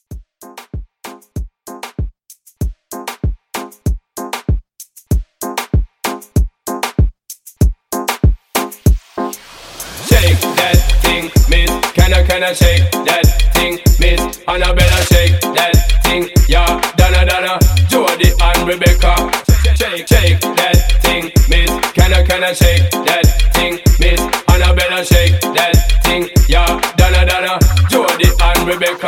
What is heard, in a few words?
Moombah Blend